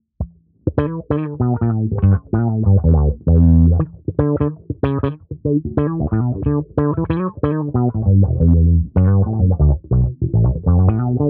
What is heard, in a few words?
T Wah USB